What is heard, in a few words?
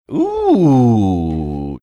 "ooooh"